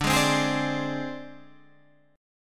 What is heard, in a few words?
C Suspended 2nd Sharp 5th, D